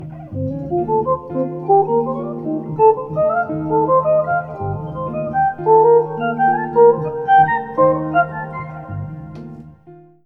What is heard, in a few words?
Lick; Kreisberg